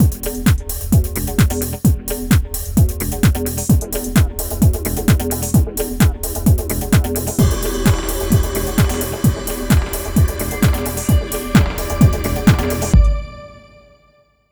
Stinger

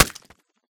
Tomato Hit; hello neighbor pre-alpha alpha 1

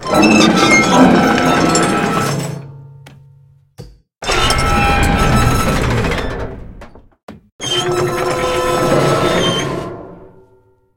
Sewer Door Open; silent hill homecoming; ps3

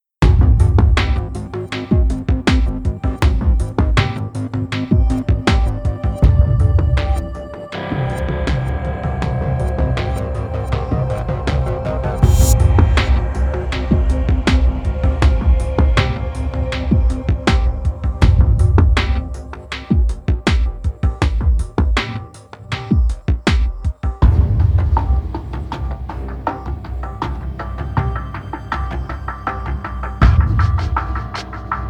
Suspense

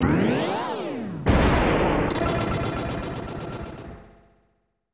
Boss Destroyed; Brandish 2; SNES

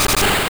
Burn Damage

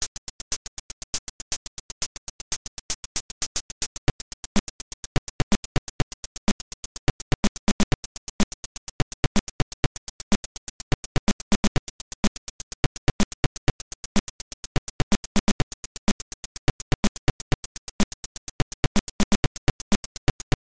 Ending (Beeper); Chase H.Q.; Spectrum

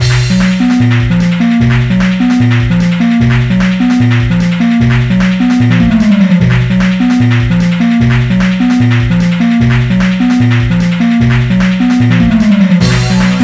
race; Airace; DSiWare

